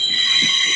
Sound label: subway sound; UNUSUAL KITS and FX; Subway Sound Construction Kit